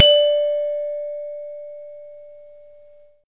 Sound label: Gamelan balungan pelog